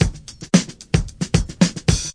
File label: 111 bpm, drum loops and breaks; 111 to 120 bpm